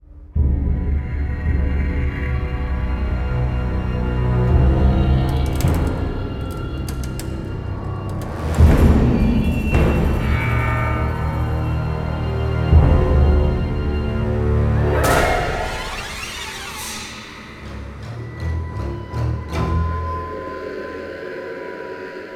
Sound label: Zombie Bgm Ambient; gamerip; Backstab; iOS, Mobile